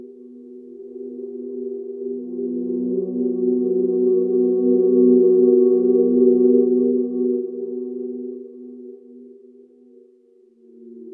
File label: Ambience; Mace Griffin Bounty Hunter; XBOX